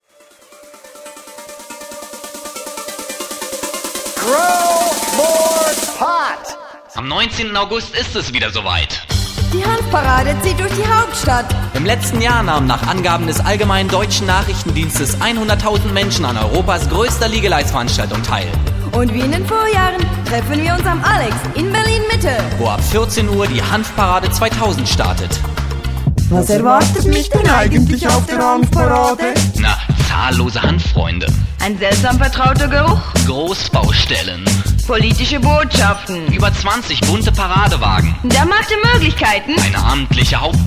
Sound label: jingle; hanfparade 2000